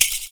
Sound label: FOOT PERC; Univers Sons - Raricussions